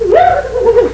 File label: WOOB WOOB